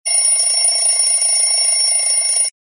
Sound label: alarm clock bell